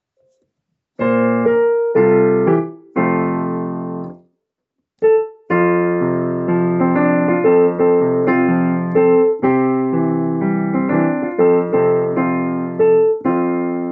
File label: Down in Bethlehem instrumental